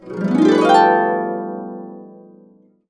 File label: B, Complete Harps; USB Soundscan vol.62; AKAI